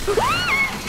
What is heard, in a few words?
Scream High pitched; IRyScream